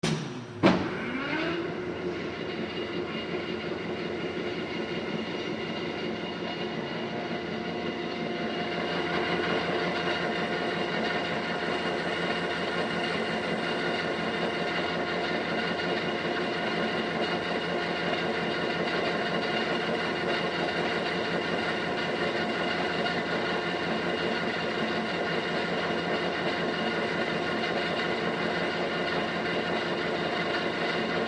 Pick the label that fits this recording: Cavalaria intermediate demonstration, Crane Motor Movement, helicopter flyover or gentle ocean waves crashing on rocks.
Crane Motor Movement